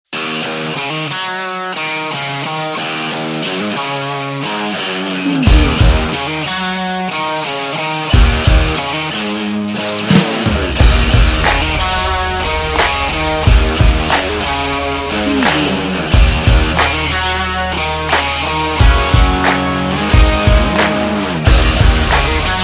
stylish rock

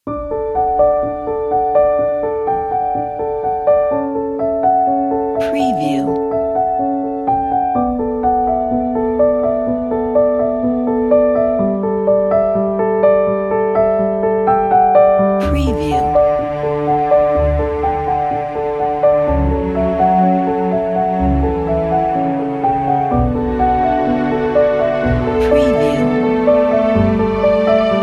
wedding and valentine

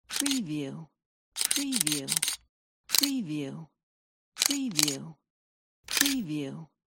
camera shot

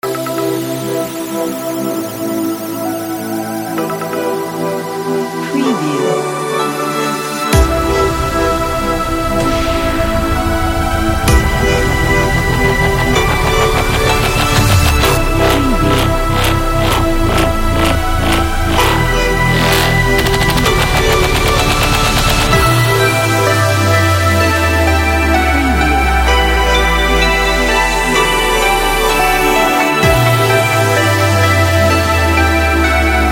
electronica soundscape

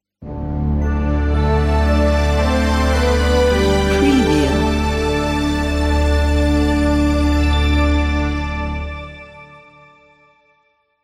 cinematic logo

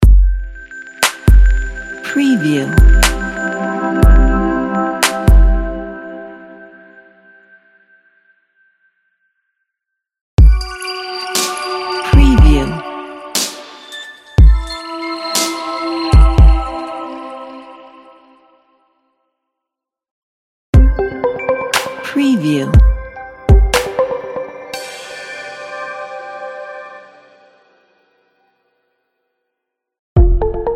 hi tech logo

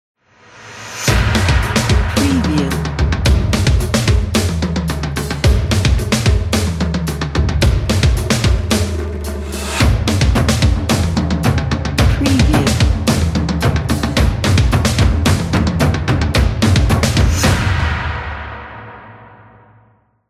percussion energy logo

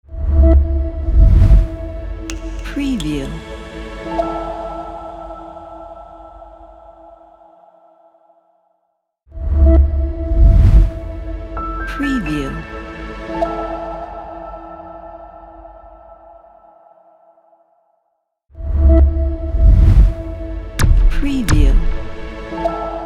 magic logo

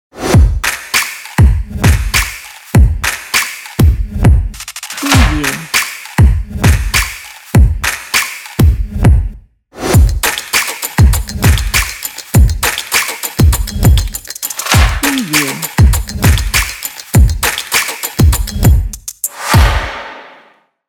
energy opener

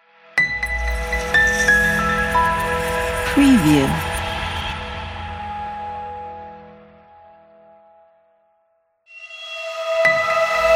shooting star ident

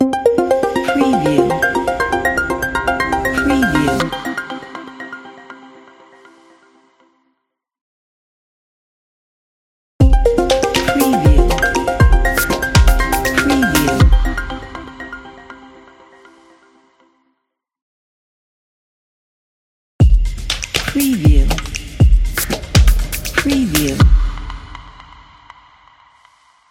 logo news

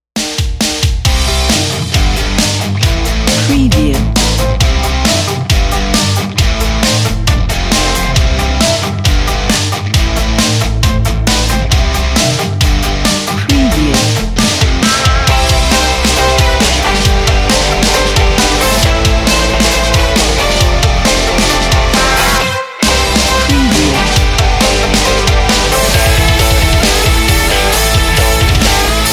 upbeat punk rock with trumpets

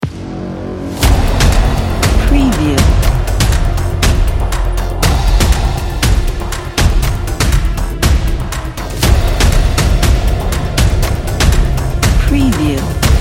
cinematic breath action trailer

